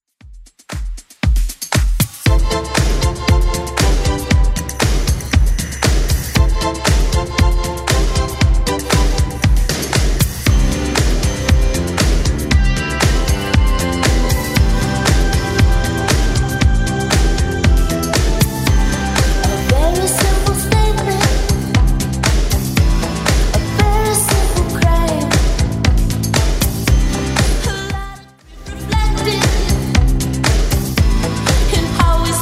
80s Club Edit 117bpm

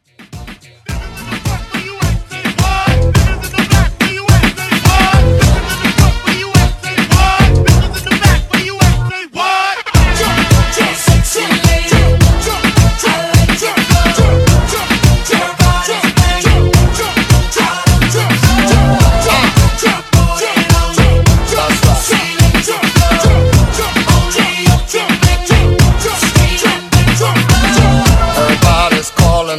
Oldschool Extended Short Hype Intro 106 BPM

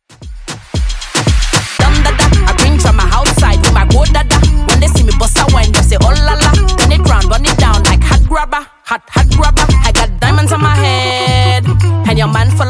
Funk Intro Outro 114 Bpm

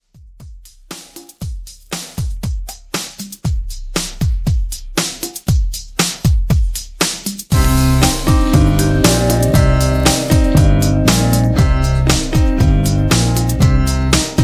Tiktok dance; Remixfreakz

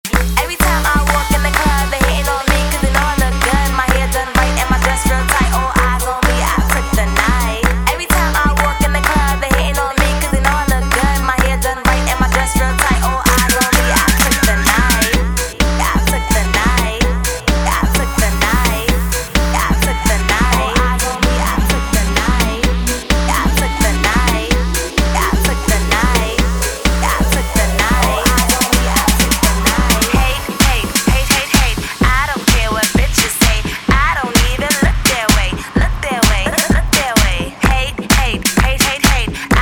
Classic Party Mash Up

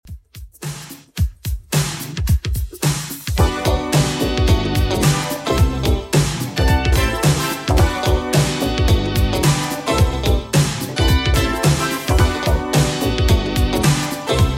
80s Redrum 109 bpm CLEAN

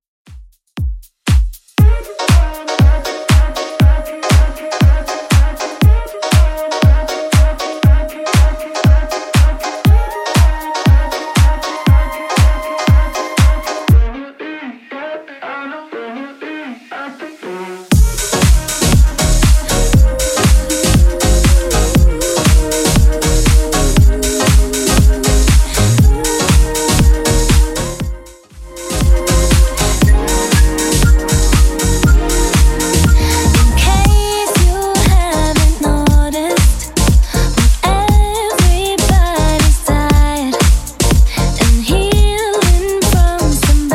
Top40 Redrum 119 bpm

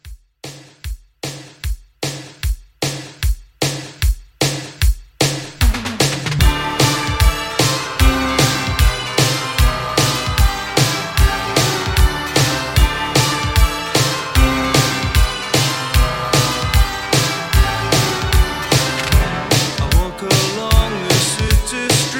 80s Re Drum 151

